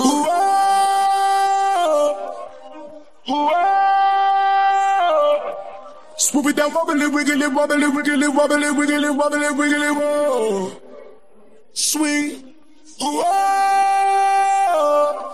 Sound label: wobbly wiggly vocals